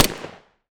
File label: body pistol shot; weapons; Half Life Alyx